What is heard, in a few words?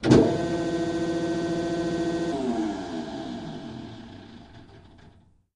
Hydraulic Notcher M, factory